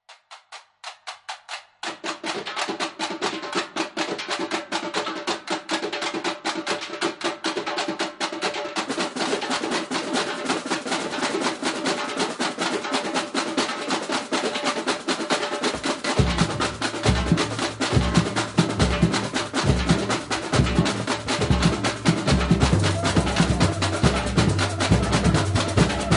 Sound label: Samba